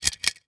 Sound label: Household Lamp Pull Cord